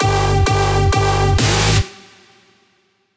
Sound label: FX Fill; NelsonNorman